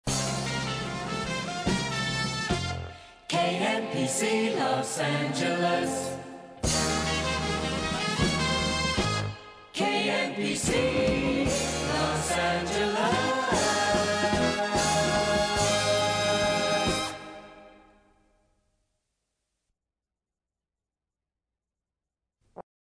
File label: Horns